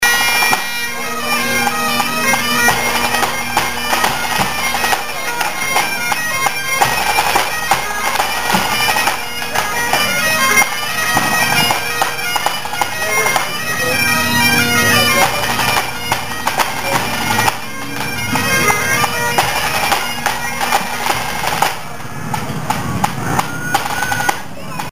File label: bagpipes